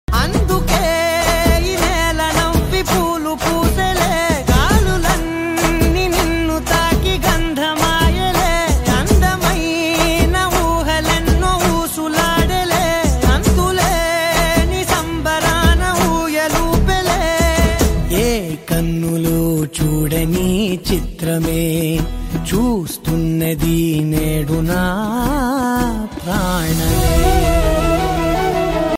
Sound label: telugu love songs; love failure